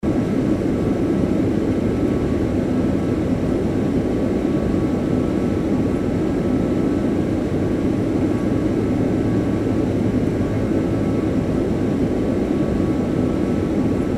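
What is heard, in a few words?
jet interior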